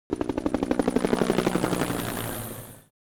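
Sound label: Helicopter Passby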